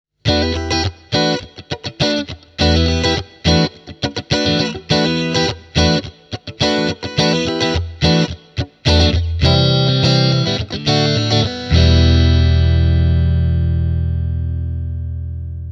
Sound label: marshall dsl5c strat classic 57